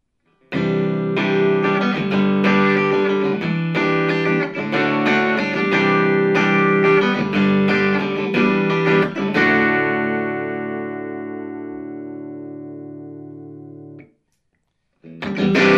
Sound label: strum bridge single coil